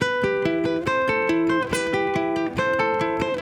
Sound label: Guitar Loop - 140 BPM E Min, Dry; Melodics; Instrument Loops; Guitars; Cymatics; Cobra Hip Hop Sample Pack